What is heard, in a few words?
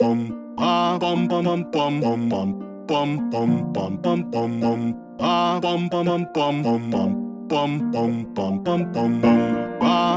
Piano Cover